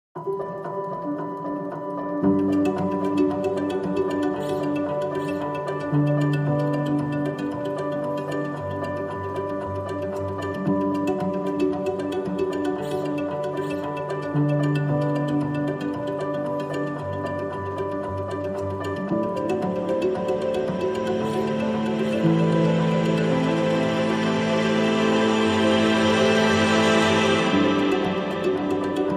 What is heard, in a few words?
No Lead Cello No Glockenspiel